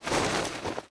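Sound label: attack staff cloth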